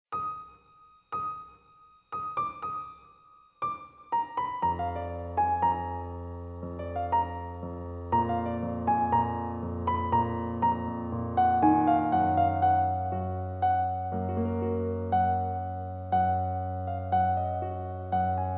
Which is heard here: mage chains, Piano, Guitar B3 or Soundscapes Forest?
Piano